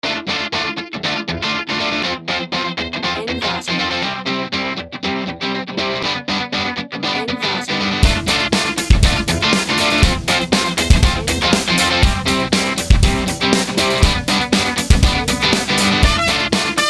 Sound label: corporate luck and motivations